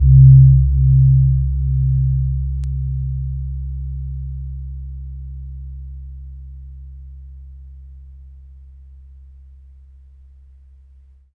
SEWUKAN D B3, GAMELAN SET; Spectrasonics; Heart of Asia

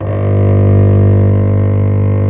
STR X BOW BA, Strings x5, Str Quartet

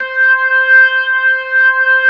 C5, B3

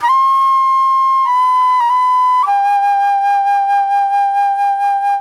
Soul-Jazz Flute, Call And Response; Sonic Foundry (Sony Creative Software); Manhattan Flutes

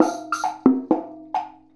Bongo and Wood Block

